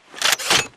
reload; wpn mgl; Titanfall 2

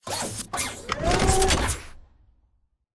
bt combat walk to idle; Titanfall 2

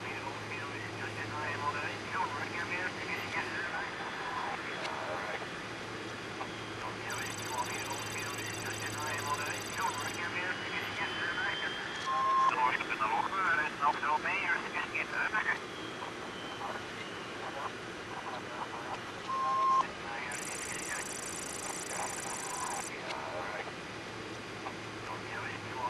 Computer Terminal; Blackwater; Titanfall 2

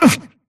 grunt hits; Titanfall 2

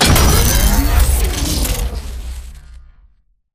HUD Titan Battery Pickup Charge; Titanfall 2

